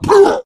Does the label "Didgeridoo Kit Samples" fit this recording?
No